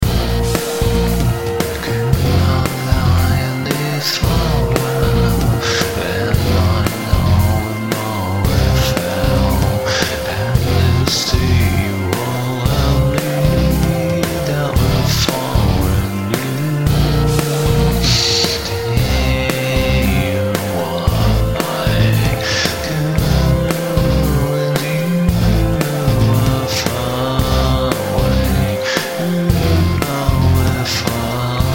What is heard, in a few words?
booing numetal; UJAM